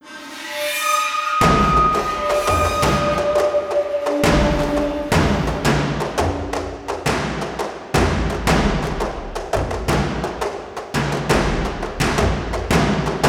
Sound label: powerup; tetsumo party